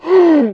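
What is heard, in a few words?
ZOMBIE HURT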